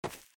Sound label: grass; alternativaplatform; alternativa3d v5; temple